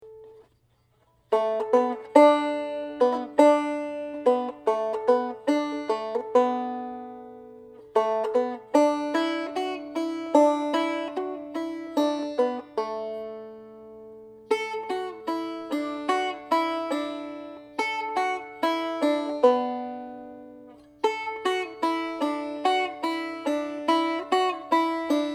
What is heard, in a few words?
Angelina melody